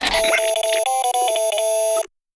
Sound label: ringtone; heartman